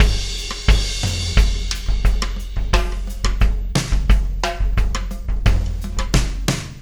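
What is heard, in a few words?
Beat, left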